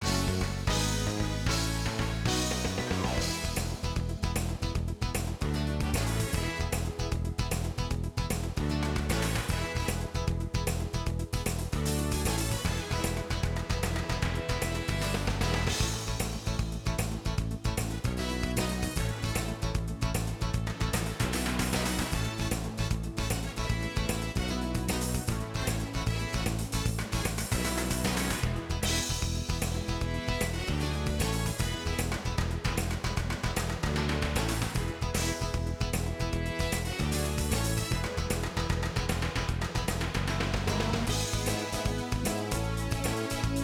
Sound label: Menu; 3D Ultra Pinball 4 NASCAR